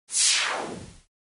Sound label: swap